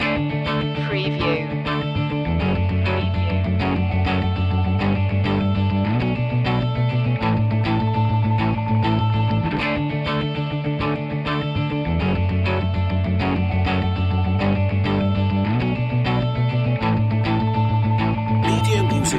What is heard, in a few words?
live loop